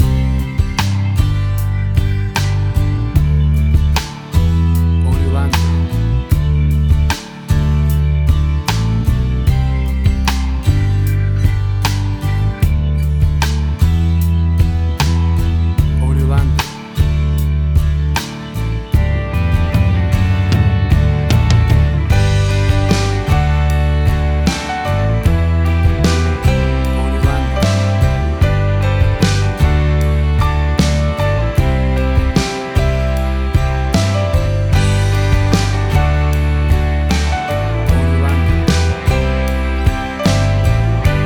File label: Acoustic Country Rock Band